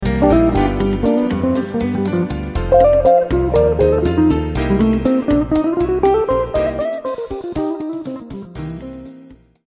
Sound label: five four licks